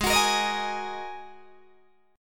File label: G sharp 7th Suspended 2nd G sharp Guitar DADGAD